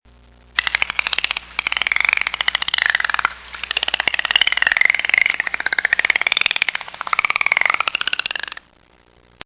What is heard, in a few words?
dolphin clicks